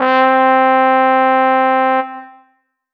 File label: Roland GR-1 Trumpet C5